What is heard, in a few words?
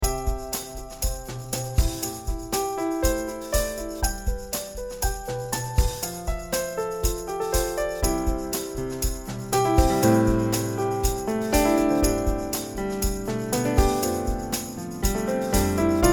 bgm loop